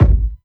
round bass drum single shot g sharp key

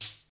Studio Hat C Key, Loudest frequency: 8630Hz; hat samples

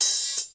80s crisp open hat sound g sharp key; open hat samples

